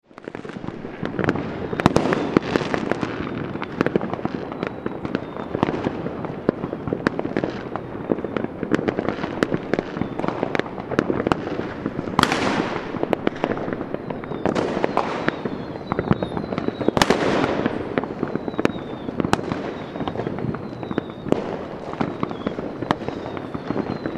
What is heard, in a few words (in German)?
grosses feuerwerk mit boellern; salamisound